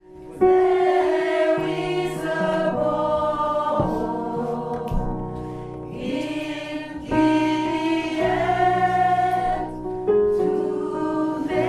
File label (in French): refrain mezzo, gospel